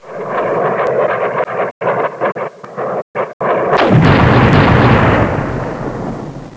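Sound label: engine sputter; MAMMOTH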